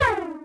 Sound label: overcharge slow, weapons